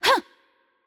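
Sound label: Attack Light, Korean; VO; bloom minigame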